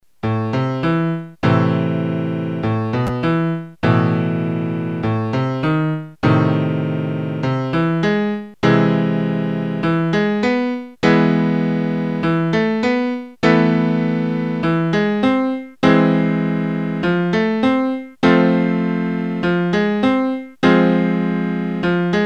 hungarian scale three note chords

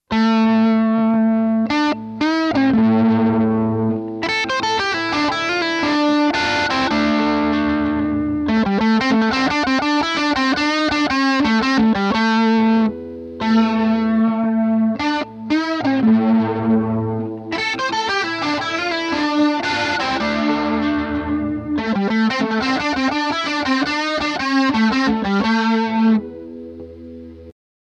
flanger; orinj

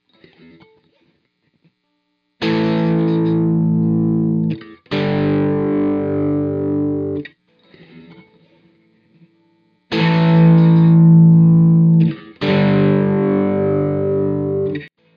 simple reverb; orinj

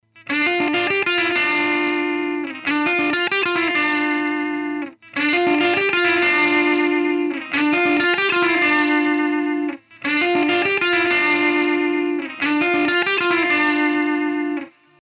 delay short; orinj

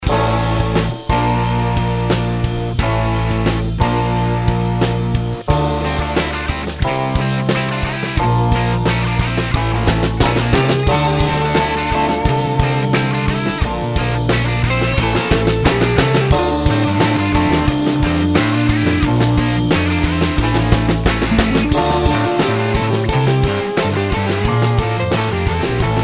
guitar and bass

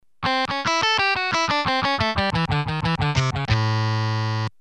lick

